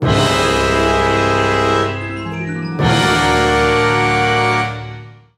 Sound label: Cinematic; Ghostbusters; Doubleghost TmExp